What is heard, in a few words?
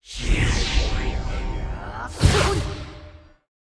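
sorcerer effect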